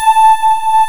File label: SYN, SYNTHESIZER; DIGI WAVE #1